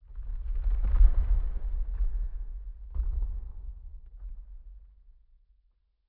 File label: rock fall; Rust